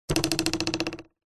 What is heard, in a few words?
cartoon twang metal ruler on plastic; zapsplat